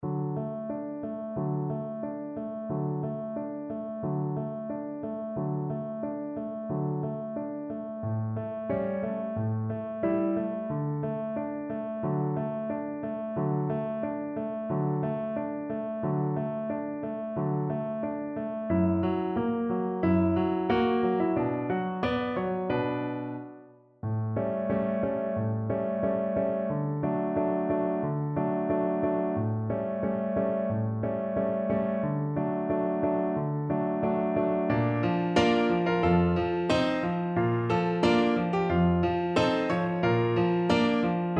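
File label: viola karaoke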